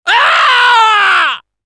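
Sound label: pain critical death, fr; Spy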